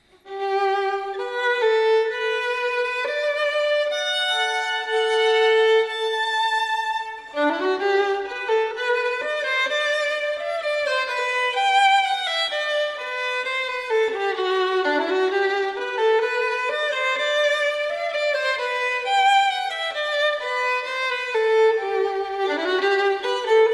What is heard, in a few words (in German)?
Geige